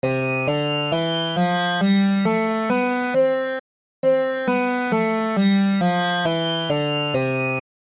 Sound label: Veena